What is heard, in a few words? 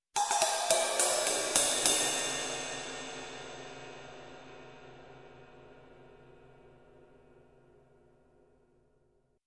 Various Cymbal Hits